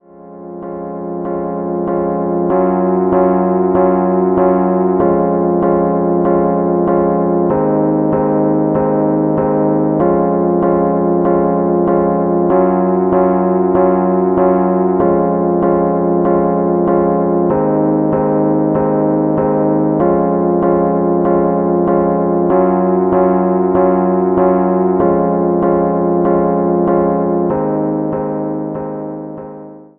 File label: Rhodes chord, hip hop chords; rhodes chord 04